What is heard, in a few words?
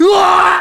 Fox Knock Out